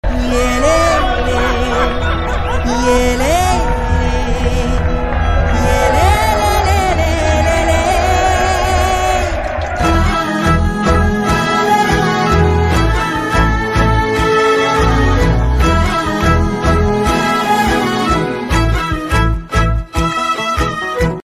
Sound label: bgm ringtone malayalam ringtone; varshangalkku shesham; pranav